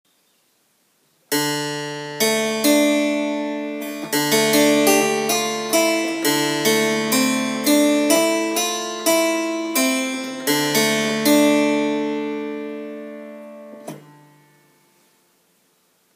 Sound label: Clavicytherium; Wolkenstayn